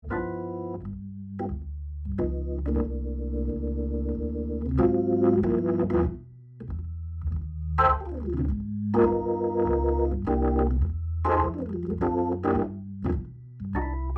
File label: nord c1